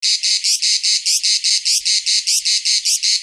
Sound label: loop perc